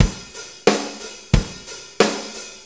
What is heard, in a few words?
90 ROCK, DRUMS